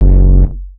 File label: F#0, Synths; Machine Punk; Ableton Live 12 Lite; Core Library